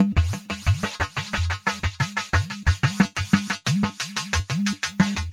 Percussion, Drums, Trance; Sonic Foundry (Sony Creative Software); Trance NRG VOL-1

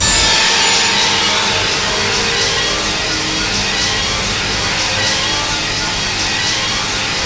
G#3 ORCHE; Northstar; The Wizard VOL-1; ORCHESPHERE 1

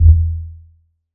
Tomm, One Shots; Sonic Foundry (Sony Creative Software) - Future Retro Dance Excursions

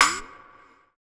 Fx Vox; Equipped Music; Loungin House

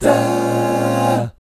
guy chords, vocal, jazz; ILIO; Vocal Planet VOL-3; Jazz & FX

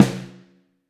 SLOW FUNK, SNARES; Back In Time Records; Big Bang

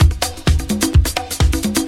Latin Loop 128 BPM

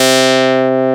SYNTH CLAV; WS#4 ROCK

